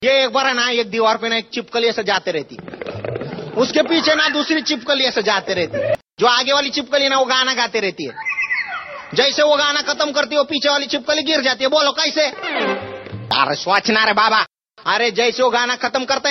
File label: most funny tone